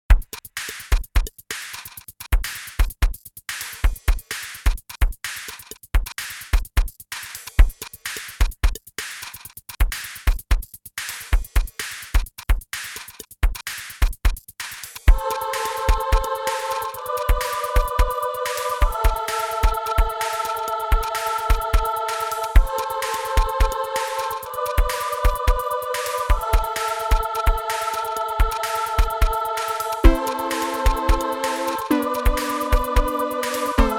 gem ws2 midi workstation

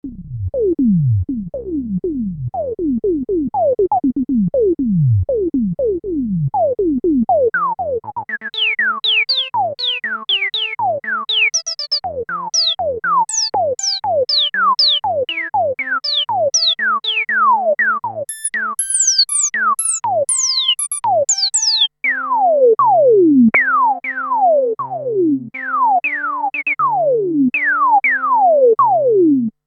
Korg Prophecy filter, lpf + lpf ser q100

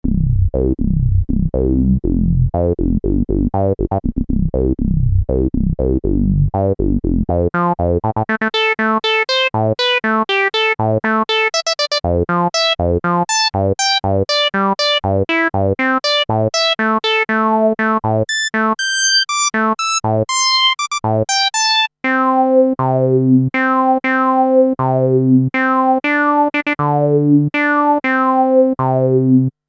korg prophecy ssp-1 filter lpf q075

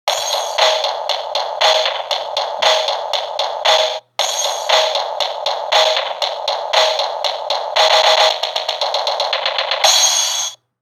finger drums

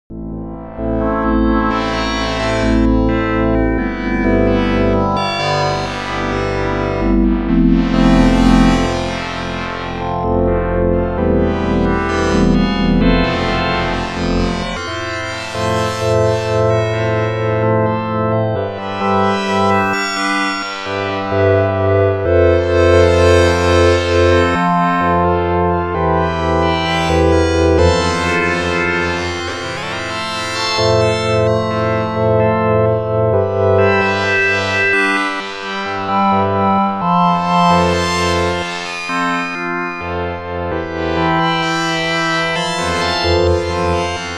kawai k3 sounds, wavetable pad; digital waves into analogue filter

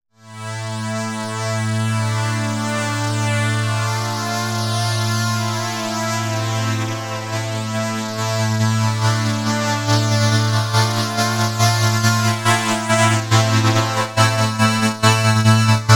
filter loopmorph; prosoniq morph vst

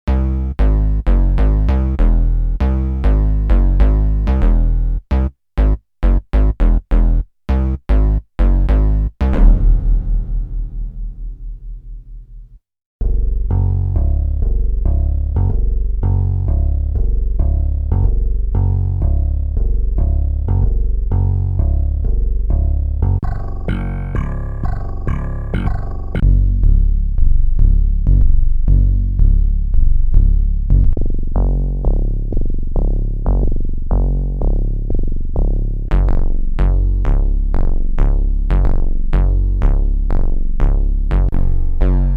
yamaha psr-28 sounds bass

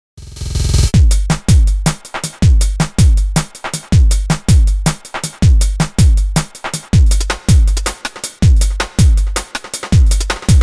quasimidi sirius; factory demo

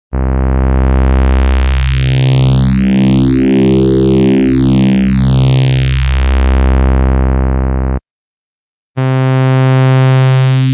hohner hs-2 e sounds saw5 to sin phase distortion

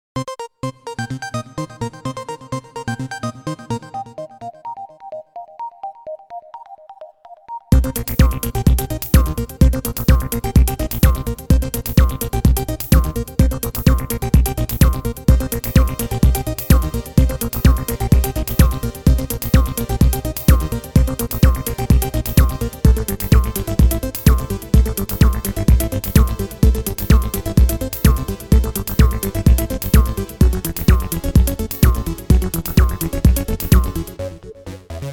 quasimidi sirius elektro; factory demo

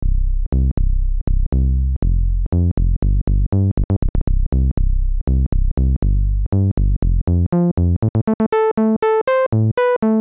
Emagic ES1 filter, lpf24 q000

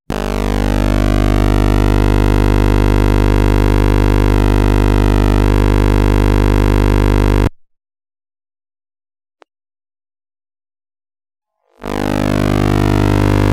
emagic logic exs-24 mkii sounds, mt-32

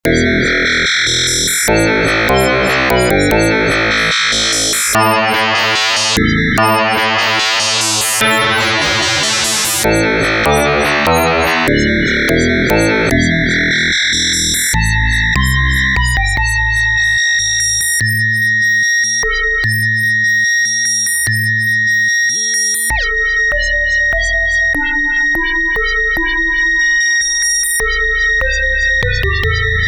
hohner hs-2 e sounds, fm test

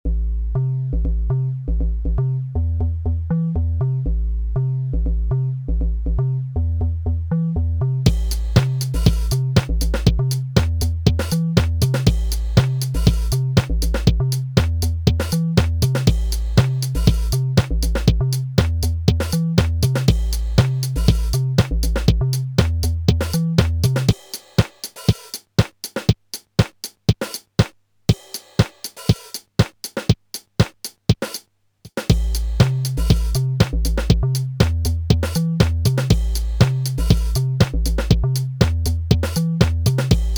yamaha pss-780 sounds custom drummer

